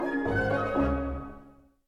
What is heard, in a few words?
Neutral End 60bpm; asterix obelix slap them all 2; Music Dialogue